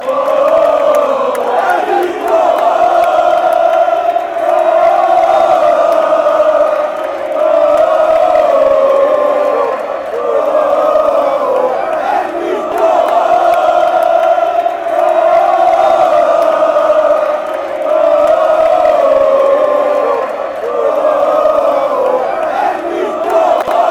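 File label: sao paulo chant, football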